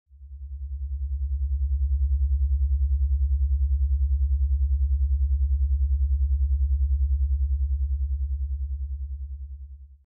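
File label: Frequency 60, 60 Hz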